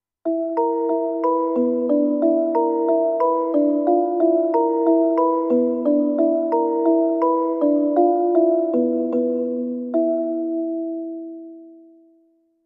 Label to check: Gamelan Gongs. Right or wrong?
Right